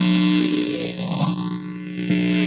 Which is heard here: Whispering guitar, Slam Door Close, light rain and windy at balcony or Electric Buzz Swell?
Electric Buzz Swell